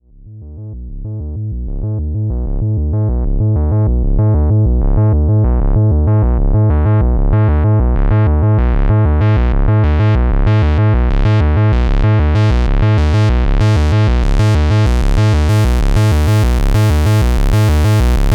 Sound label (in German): Stepsequencer; Bullfrog